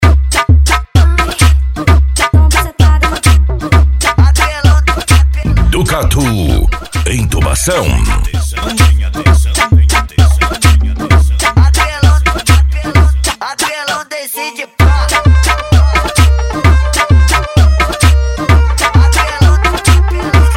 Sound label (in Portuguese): funk agressivo